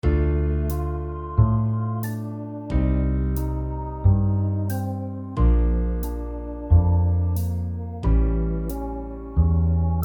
jazz

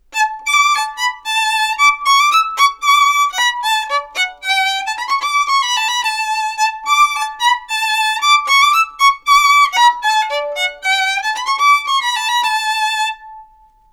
violin samples Happiness

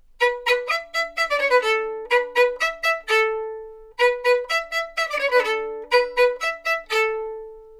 violin Happiness

